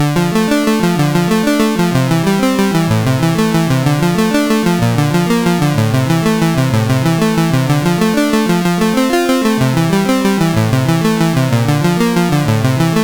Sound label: Ultimatune, sid CSG8580R5